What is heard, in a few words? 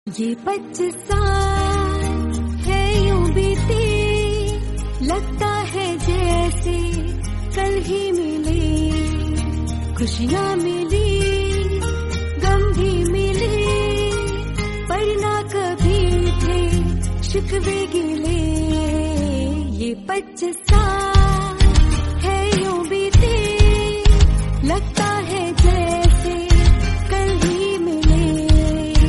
marriage anniversary song hindi